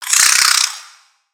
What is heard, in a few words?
Spider Angry; creatures mechanical; Lego